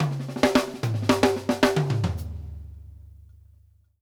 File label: OFF TOMS, DRUMWORKS FILLS 108